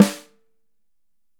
5X14, Drums; Big Fish Audio; Ross Garfield Drums VOL-2

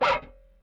Rickenbacker Bass; Airwindows; Rick-Xtras

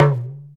PER Percussion; Mix-Percuss

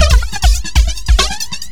LOOP FX, Big Beat Drumloops

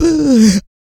PERC, MALE PERC

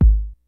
G-FUNK KIT, DRUMS; Kid Nepro; Elite

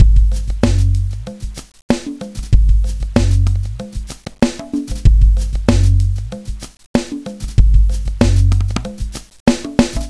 World 95; Funkyxtreams

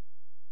Syn Vox C4, Pad; HaloPad

